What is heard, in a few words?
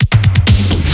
Fill Ins 128BPM; Vengeance Samples